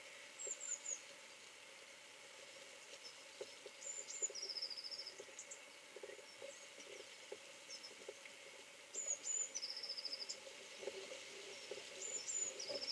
kek cinege hangja

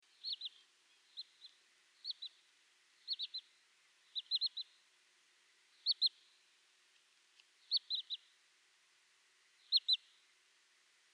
reti canko hangja

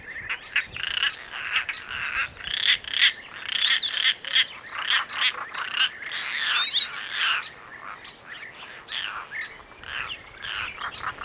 szalakóta hangja

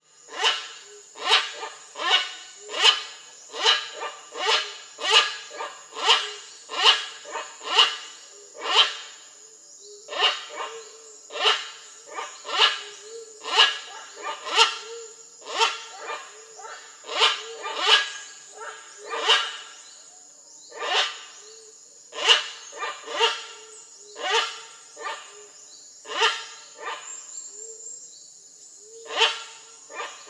sisakos gőgé hangja

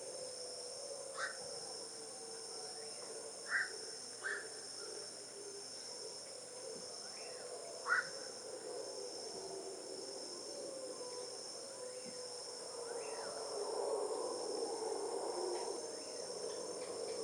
bakcsó hangja

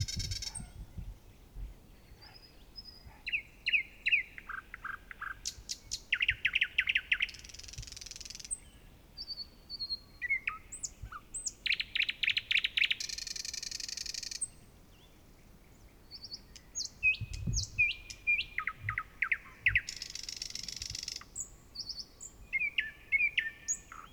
nagy fulemule hangja

